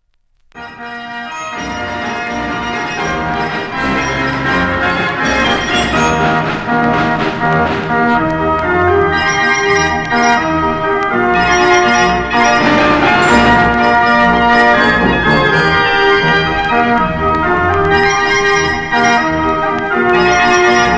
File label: gavioli organ; schlager parade